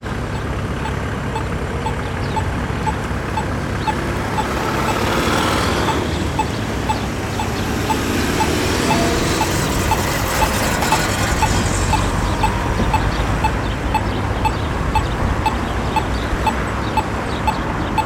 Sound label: Traffic Lights And Blind Beeps